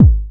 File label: phat kick drum one shot g sharp key